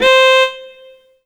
Yamaha TG100 Fiddle C5

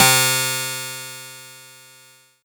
Kawai K1 II Harpsichord C3; Synthesizer - Keyboards

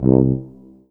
Yamaha TG500 Tuba C2

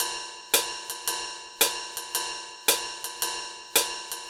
Roland D-20 Jazz 112 bpm

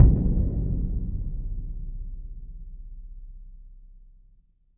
Boom

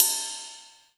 Korg NS5R Power Ride Cymbal

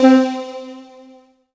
Yamaha TG100 Halo Pd C4

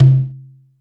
Doumbek Doum

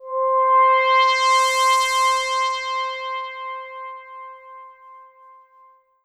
M-Audio Venom Fractalize C4

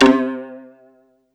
Ensoniq SQ-1 Biwa C4

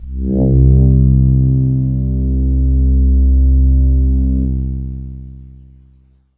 Casio VZ 10M Astral C2

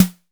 Snare Drum

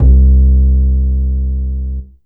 Yamaha EX5 Wood Bass C2

